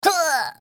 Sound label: munch fall hit; Oddworld